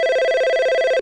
Ring, phone; Интернет Телефония

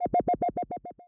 Flying Saucer

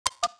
Click; Worms3D

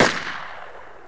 Uzi End; Worms3D

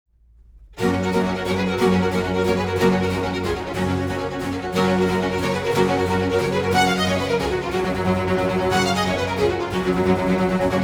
Concerto in G major 'alla rustica', I. Presto; Vivaldi

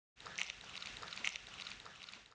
wriggle

